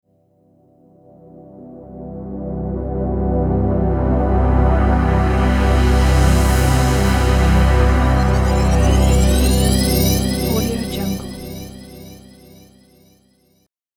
reverse shimmer swell logo cue

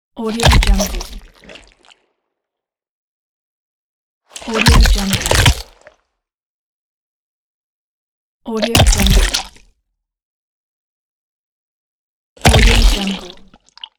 crunchy impact

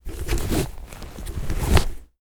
profession leatherworking crafting sewing tying; empire game; string

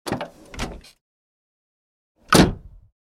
opening closing car door; crisstyna

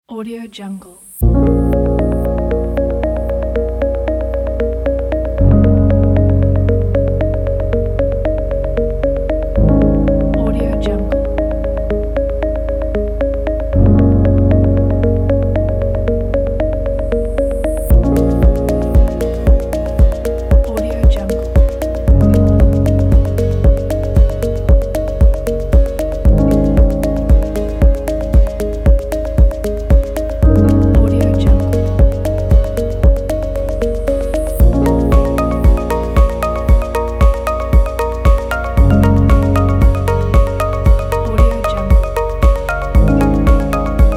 corporate slideshow

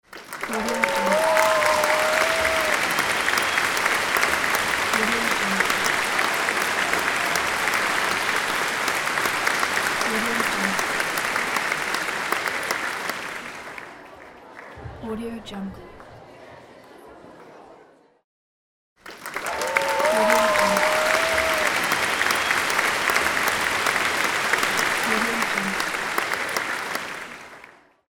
that applause; mega-loop

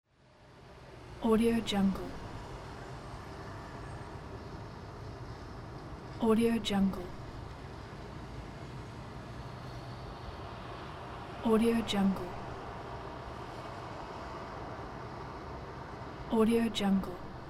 light traffic

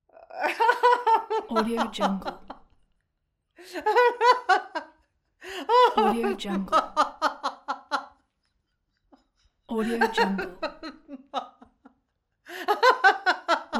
woman laughing

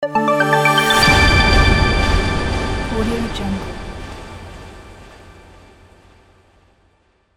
minimal logo

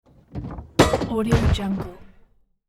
trap door opens

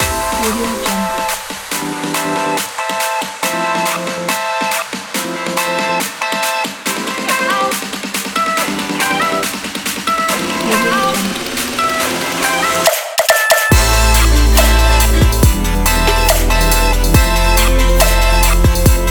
future bass style

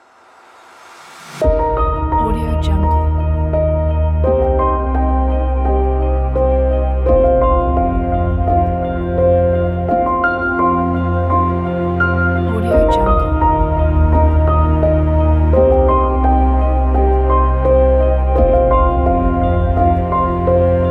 the inspirational documentary

